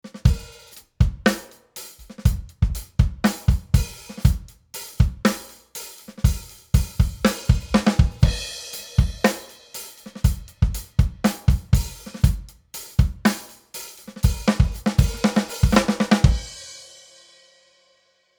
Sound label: Drums EQ; Lang PEQ-2